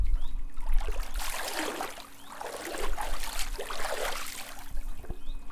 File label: Water Waves